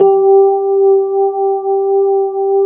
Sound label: FENDER G3 R, Keyboards Old School; USB Soundscan vol.09; AKAI